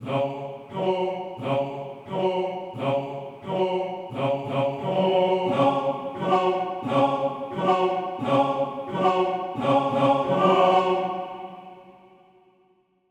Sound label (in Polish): klasyk choir